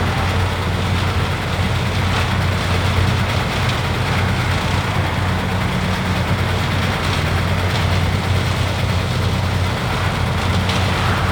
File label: Barrel Track Loop; Warframe; Large Cargo Room